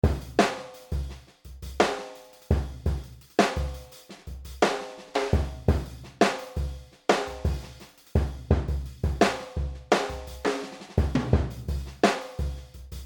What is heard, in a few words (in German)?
Groove Ludwig Uebersprec